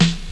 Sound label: snare drum, percussion instruments; Sound Effects for Multimedia - Volume 1